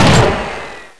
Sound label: slave shoot; aslave